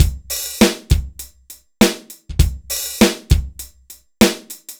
dry Loop, BPM 100

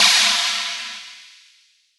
ELEC CHINA, TR-909 drums

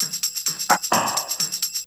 BEAT4, Roots Disco; USB Soundscan vol.32; AKAI

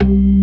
CLASSIC C3, Synth and Organ

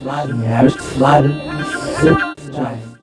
VOX FX, Funky Vocals; USB Soundscan vol.18

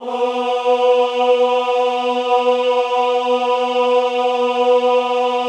AHH B2, Classical Choir; Best Service; Extended Classical Choir; AHH MIX

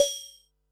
Perc, Percussion and FX; Pearl SC-40

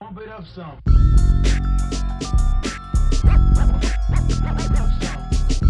99 BPM, Hip Hop; InVision Interactive; 40 Oz Phat Slammin

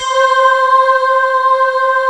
CHRIST C5, Choir; USB Soundscan vol.28 - Choir Acoustic & Synth; AKAI

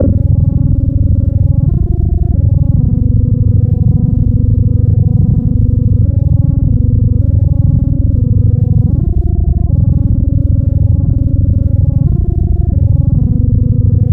SUB WAVE, Special FX Twisted

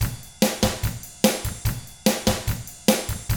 rock drums

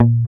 GUI MUTE, E-Gui Muted

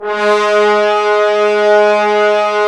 BRS, Roland LA Composer; Tbns

